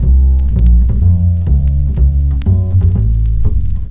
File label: Acoustic Bass, Loops; ACID; Basses